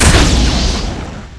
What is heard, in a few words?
Weapon Axe Hit